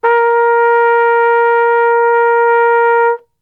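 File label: A#2, Flugelhorn; Best Service; Brass Super Section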